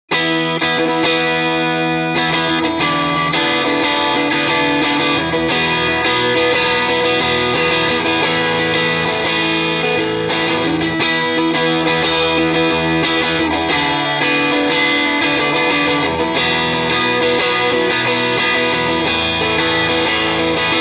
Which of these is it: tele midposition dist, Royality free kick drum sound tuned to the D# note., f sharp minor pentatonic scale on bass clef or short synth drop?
tele midposition dist